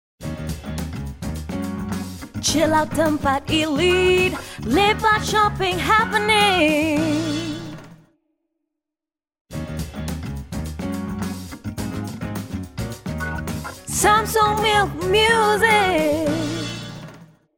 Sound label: Funk; SAMSUNG MILK; Bangsar